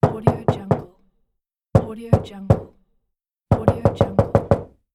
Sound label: the knock on a door